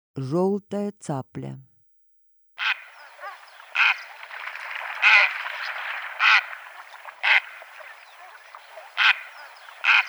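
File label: zheltaya caplya golos, squacco heron voice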